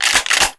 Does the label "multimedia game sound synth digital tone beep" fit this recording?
No